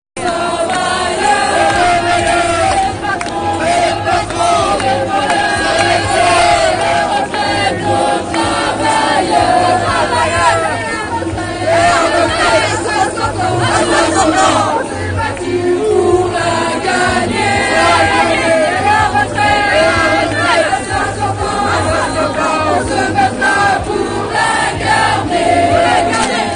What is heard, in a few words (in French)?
chant